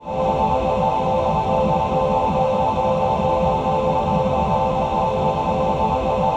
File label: VOICE PAD; Best Service; XX Large; Pads